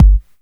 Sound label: Soft Kick; Vengeance Samples